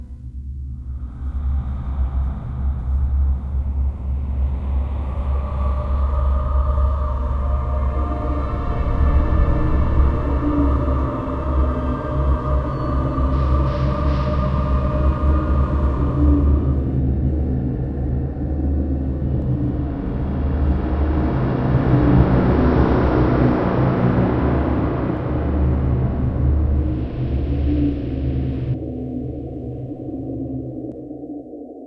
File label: glow atmospheric background